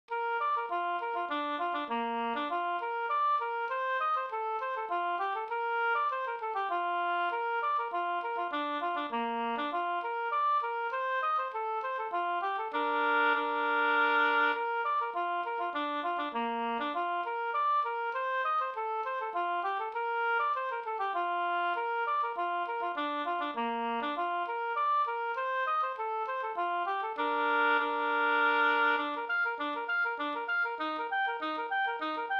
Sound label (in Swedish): polska rullpolska